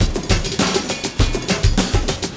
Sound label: LOOP, Drum & Bass, 100 BPM